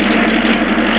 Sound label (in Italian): tank muovi, motori